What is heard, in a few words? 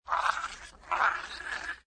CPU Voice; Generic gear turning spinning sound - Variation 3; High-Tech; Multimedia